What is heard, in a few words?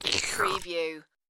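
Throat Slit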